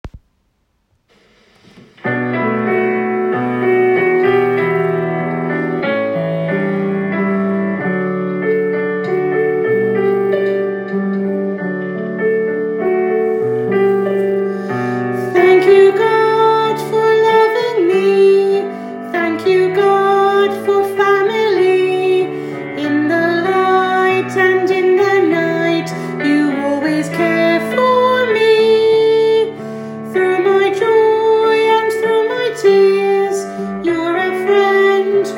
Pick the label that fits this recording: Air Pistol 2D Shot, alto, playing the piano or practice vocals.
practice vocals